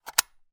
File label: gun mossberg; still north media